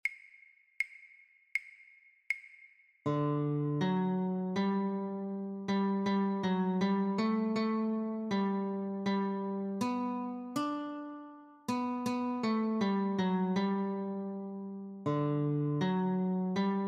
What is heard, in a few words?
Notes, guitar; christmas